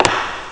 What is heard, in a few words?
step high; Amiga Soundtracker Sample Packs